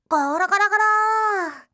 gorogorogoro; dousa

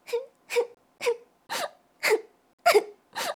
nakigoe, kanjou

